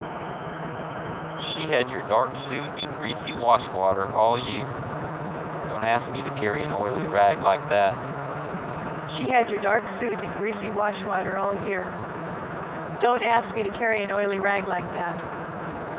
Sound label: car, noise